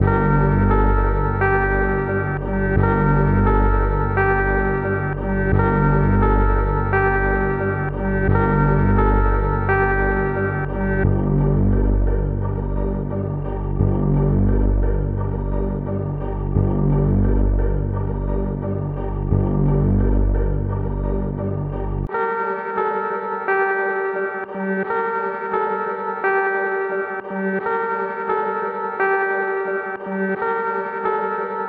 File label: 87 BPM G Min, Melody, Trap; Cymatics; 2022 Melody Collection; Trap Pt 1